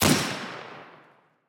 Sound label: shoot, arms; EMXArms; emx ares